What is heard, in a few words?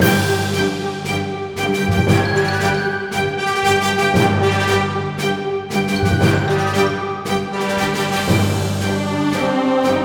euphorium battle loop b